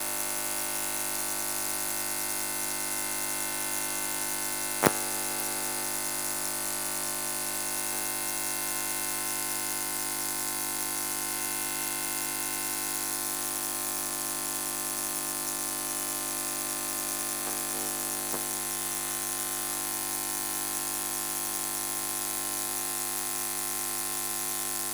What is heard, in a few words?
Circuits Electric Hum Buzz Noisy; ShapingWaves